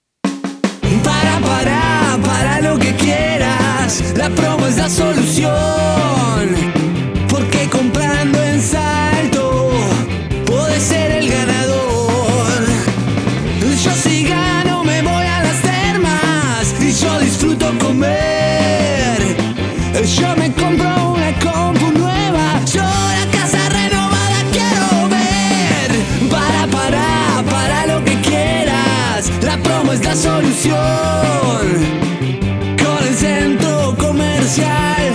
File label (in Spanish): Jingles